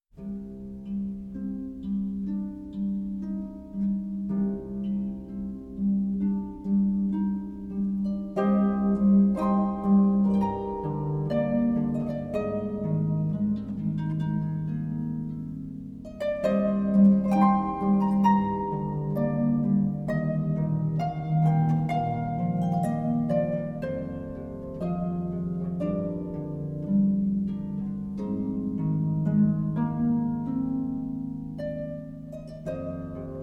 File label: harp explore day; vistaharp